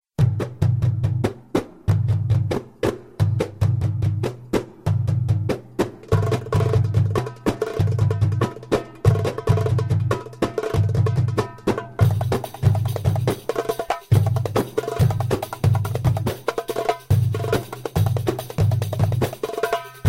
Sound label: drum dance ringtone